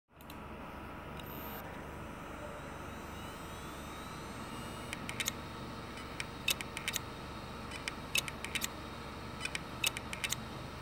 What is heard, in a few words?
Heads TOSHIBA 500GB 3.5 DT01ACA050, damaged hard drive sounds